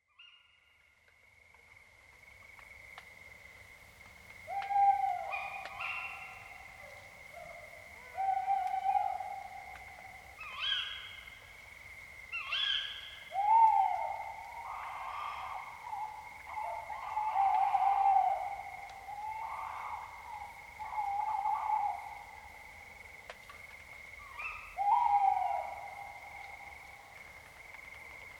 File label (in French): chouette hulotte